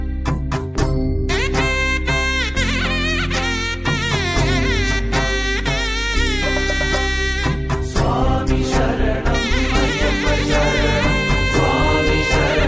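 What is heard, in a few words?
background music ringtone; REACHED PAMBA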